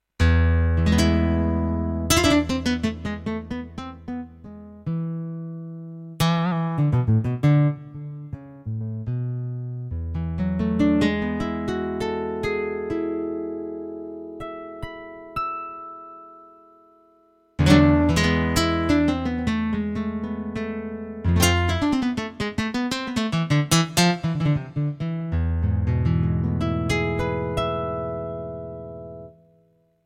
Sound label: Nylon Acoustic; NylonSTR1